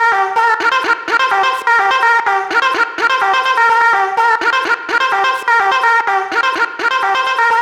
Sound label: vocal chop, 126, Gm; FastOne; Slate Digital Omnivox Vocal Sample Pack; Chops